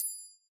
win countup End; netent

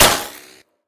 drive By Impact Hole; netent; narcos

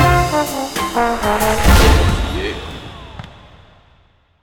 big win end; netent; copycats

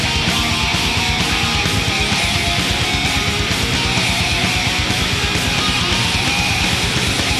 big Win Loop

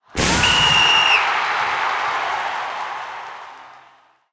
bonus game whistle; netent

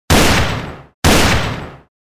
scatter Gun Shot; netent; piratefromtheeast

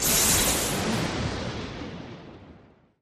lightning Shoot; netent; magicportals

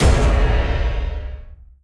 big Win Txt Change; netent; longpao

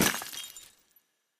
ice Break; netent; magicportals

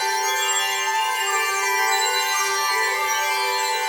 free Spin Reel Spin; netent; butterflystaxx

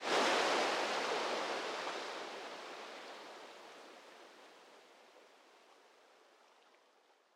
big Win Start; netent; doublestacks

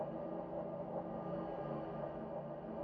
reel Spin; netent; magicportals

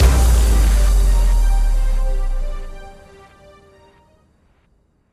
big Win End; netent; multipliermayhem

